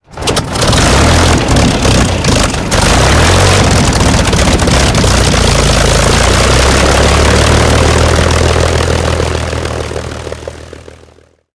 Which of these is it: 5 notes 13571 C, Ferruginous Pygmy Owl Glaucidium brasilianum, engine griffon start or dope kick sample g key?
engine griffon start